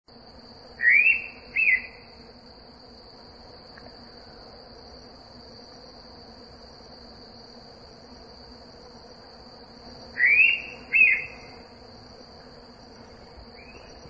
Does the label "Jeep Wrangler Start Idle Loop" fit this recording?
No